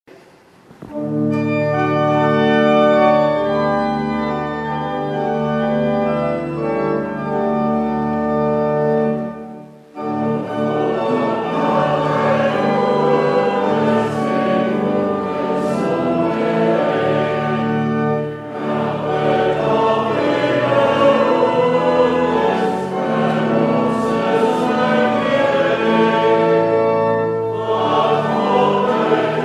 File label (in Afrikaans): Gemeentesang